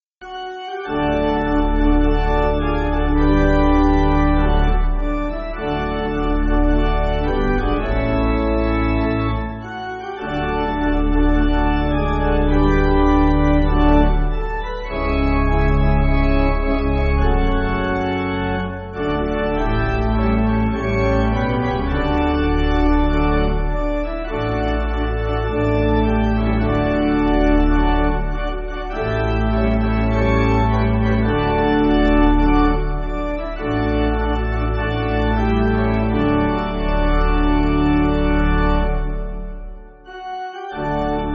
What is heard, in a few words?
Pipe, church music